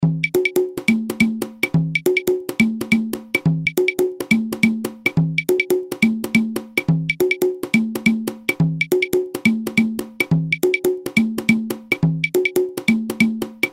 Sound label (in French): guarapachangueo cajon clave